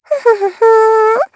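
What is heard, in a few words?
Vox Hum, Japanese; Dosarta; kingsraid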